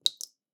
Drips

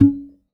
Classical Guitar Extended Technique

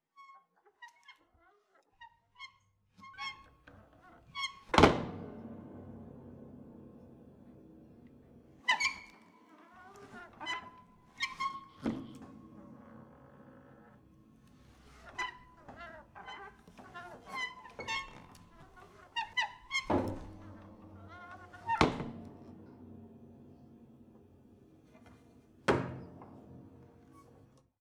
Squeaks Thumps, Piano Destruction

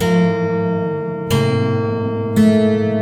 Detune, Piano; Piano Destruction

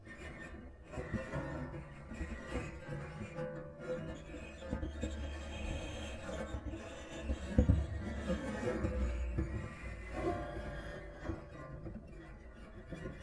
Knock Rub Erratic, Piano; Piano Miscellaneous

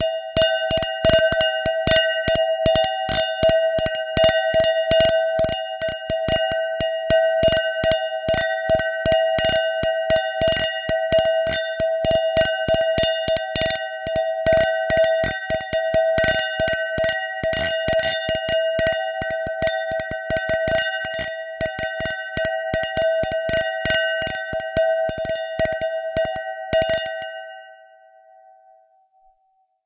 Glass Clink, Beer Glasses

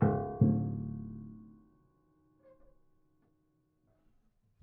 Prepared Piano

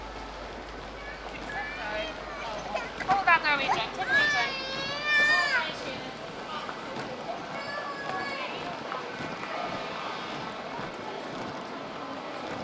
Anne Street Belfast; Sound Scapes

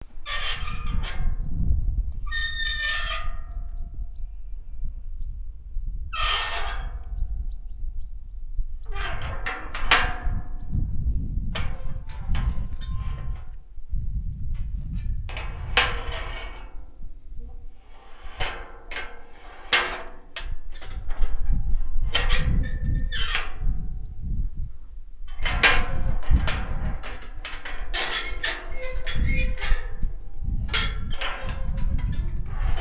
Squeaky Gate

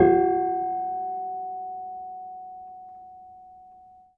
Harm Low, Piano Harmonics

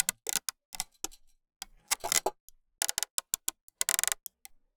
Sailing

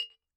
Bottle Struck

